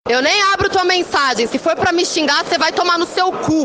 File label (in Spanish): pistola